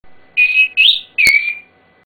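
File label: Look Back, whistle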